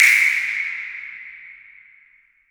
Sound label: Impact; Vengeance essential club sounds-3